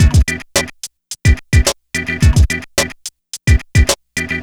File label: HipHop Guitar Break Combo E min; Digidesign; Pro Tools Essential Loops